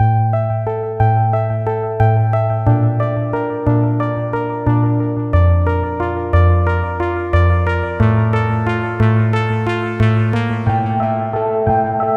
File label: keys; mosaic thorn presets